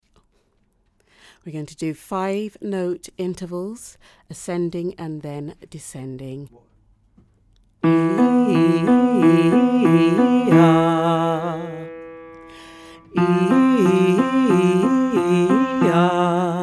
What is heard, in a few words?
5ths warm up, house music choir